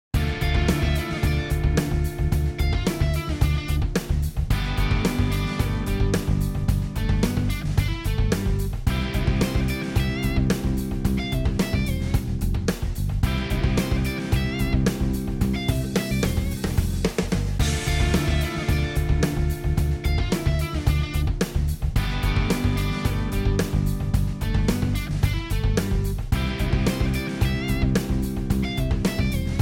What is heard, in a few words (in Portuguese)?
harmonia II V I